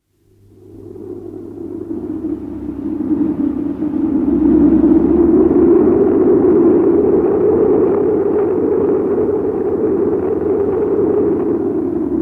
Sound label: Low-pitched wind; Weather; BBC